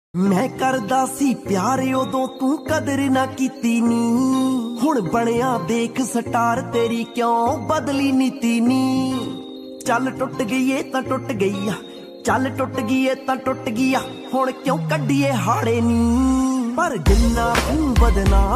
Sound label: Punjabi Beats Ringtone